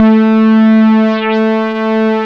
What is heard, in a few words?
ALLROUND A2, LEAD SYNTH; Best Service Alpha Dance vol.2